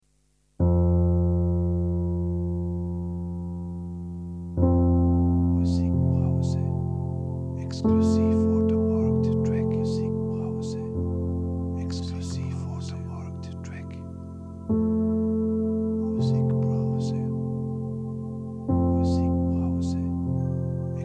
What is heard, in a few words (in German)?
Relaxing Rain Piano mit Synth